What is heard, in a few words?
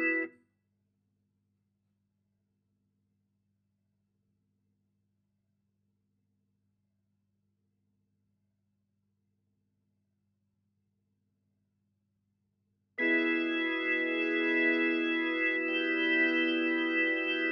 Hammond